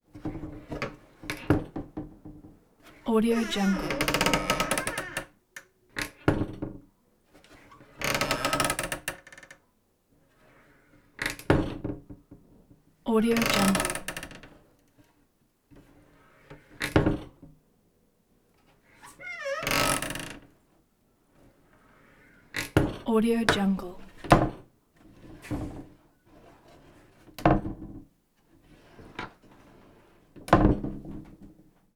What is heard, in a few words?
cabinet door located under sink open close; prosoundfx